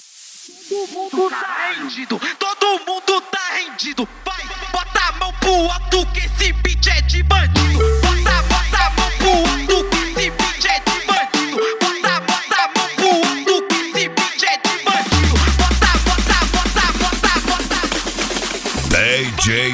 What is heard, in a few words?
beat; beat de bandido; cd especial carna vol 01